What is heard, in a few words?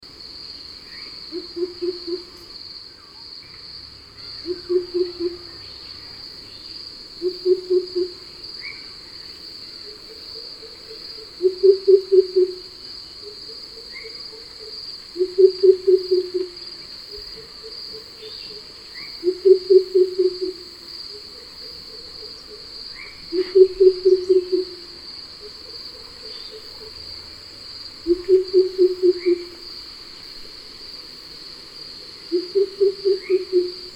Greater Coucal Sound